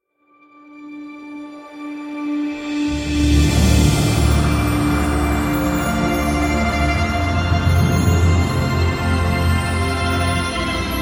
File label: Orchestral Version